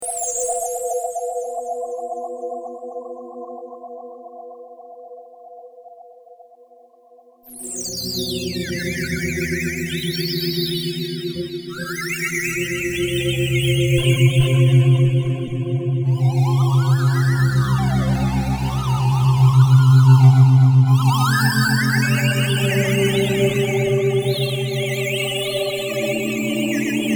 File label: Intro music; Sound Affects